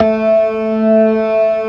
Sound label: TARK, organ combos; Keith Emerson Signature Series